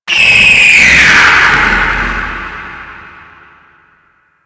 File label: Warning Screech; Enemies; DE; Warframe; Vay Hek